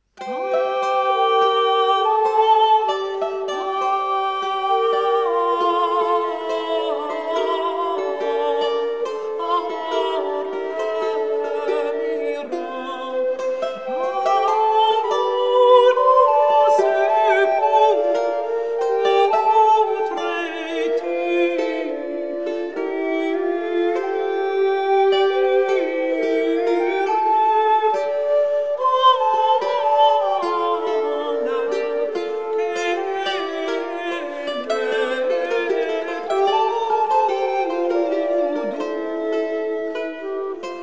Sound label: ballade; Philippus de Caserta; En remirant vo douce pourtraiture